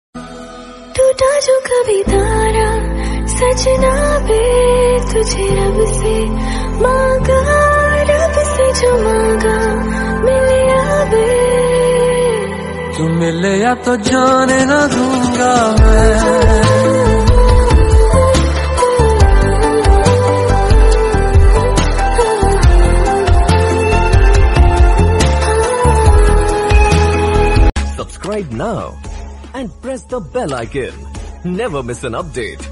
ringtone romantic ringtone love ringtone hindi ringtone